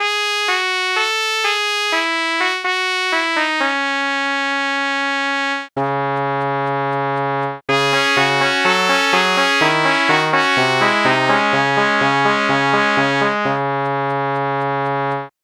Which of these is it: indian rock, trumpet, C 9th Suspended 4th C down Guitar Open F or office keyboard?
trumpet